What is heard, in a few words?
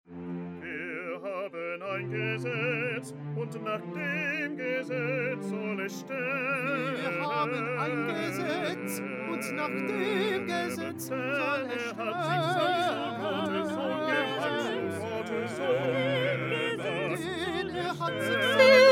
Soprano; Bach; St John Passion